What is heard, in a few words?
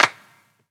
Claps; Vengeance Samples